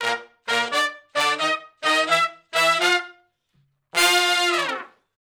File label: Sh Bls Climb Up to V (Bb) uni, Horncraft for R&B; Crimson Blue and Fabulous Horncraft 4 RnB; Sections; Shf Blus Climb Up to V